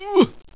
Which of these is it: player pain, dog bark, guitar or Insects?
player pain